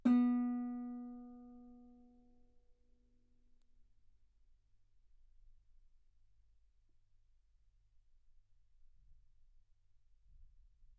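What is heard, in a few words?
Guitar ff B string B3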